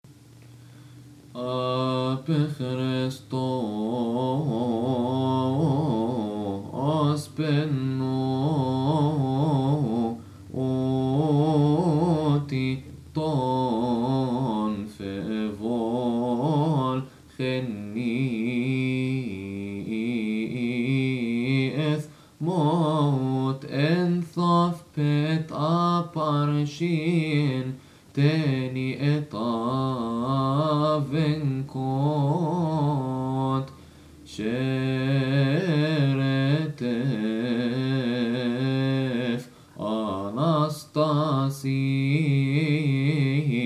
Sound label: verses of cymbals, hymns; Resurrection